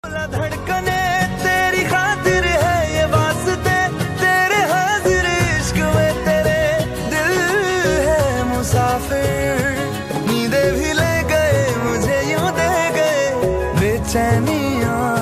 song male version

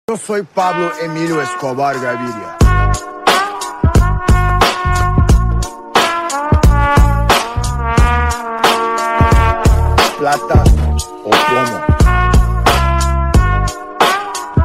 attitude ringtone; pablo escobar

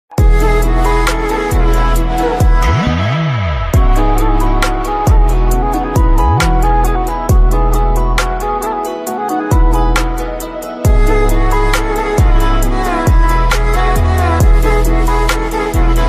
Nepali bgm ringtone

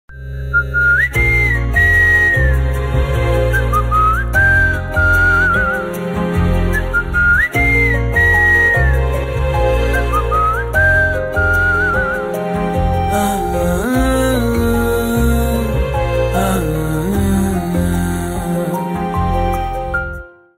bgm ringtone, whistle ringtone

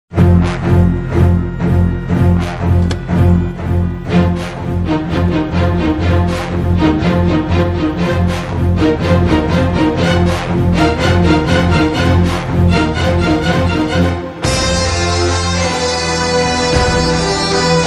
bgm ringtones telugu movies music; okkadu movie

